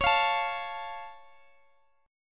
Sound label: C sharp Augmented, C sharp